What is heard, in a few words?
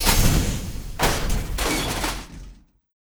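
lightgate death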